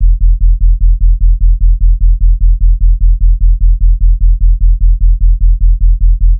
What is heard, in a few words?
Sub Loop E; Ghosthack